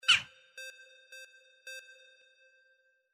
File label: CROW FORREST